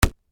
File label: Sparring Glove, Impact, Hit, Punch; Sports; Boxing; BlastWave